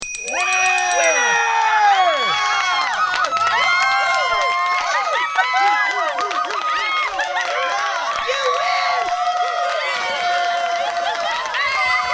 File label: BELL - crowd applause; Scratch Off; Quiz; WINNER